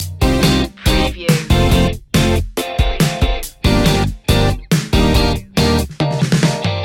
full city loop

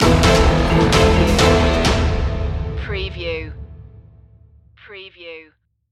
stock market crash stinger

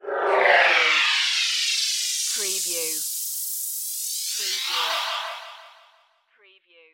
Disintegrate Ray

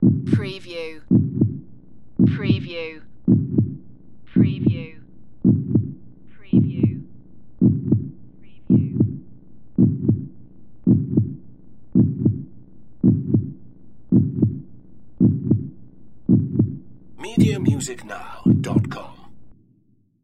Heartbeat

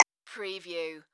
Multimedia Percussion

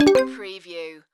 Casino Slot Machine Hit Spin Button

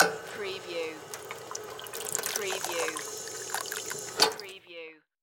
Drinking Fountain; Sound Effects Bible

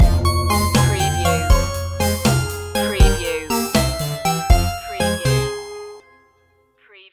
retro video game lazy world stinger

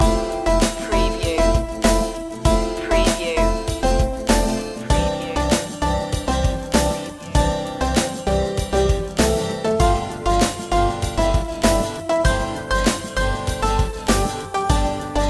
late night beach party loop

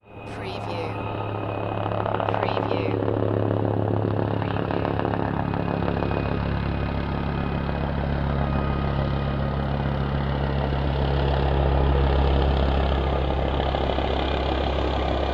Helicopter flies by; beanstalk audio

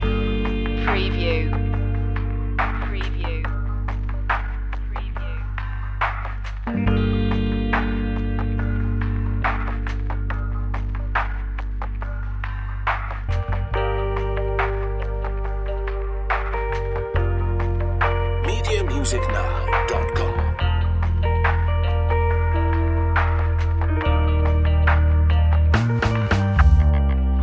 ambient magic loop

